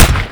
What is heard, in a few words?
Deagle